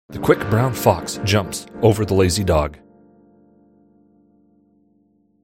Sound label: noisegate